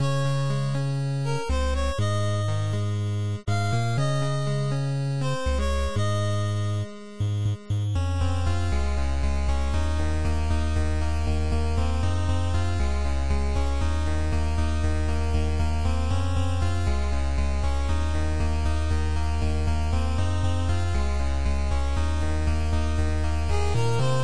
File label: loop victory; quadplay